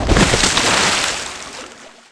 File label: bucket splash; water; Tony Hawk's Project 8; XBOX